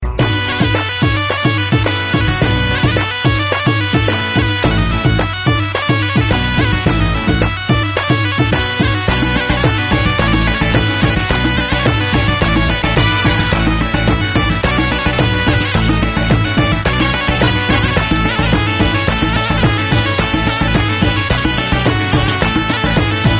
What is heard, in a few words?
pashto saaz ringtone